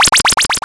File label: Health; Guardian of Silverkeep; OGG Sound FX